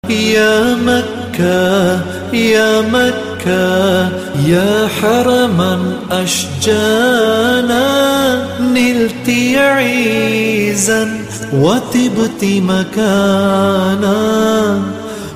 arabi naat ringtone, islamic ringtones

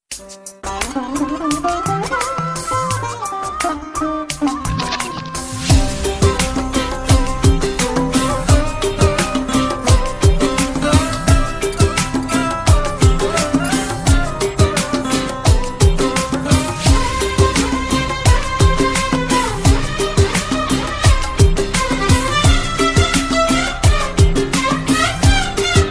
sitar, ringtone; iphone

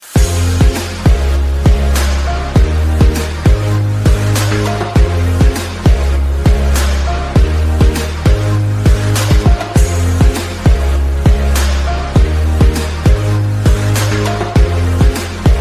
new morning ringtone; samsung ringtones

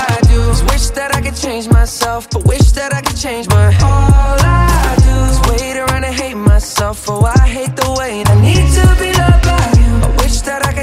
music ringtone, english

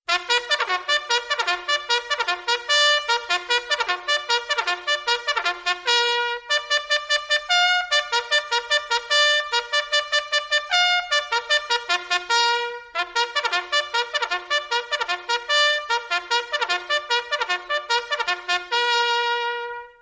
cool parade music phone tone ringtone; samsung ringtones

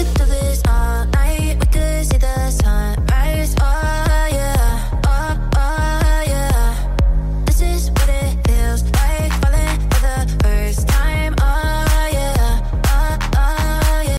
melody remix ringtone, english ringtones

